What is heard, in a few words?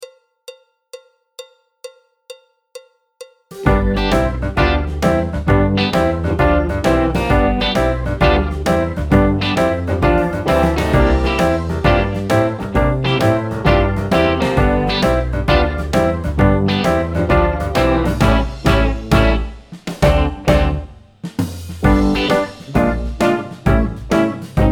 piano; Jazz Syllabus Level 1